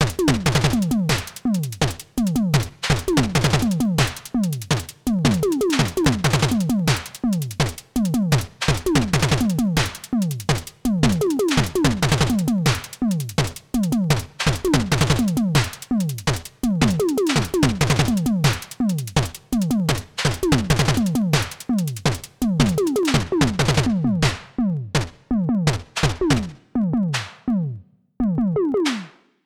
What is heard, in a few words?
chiptune kit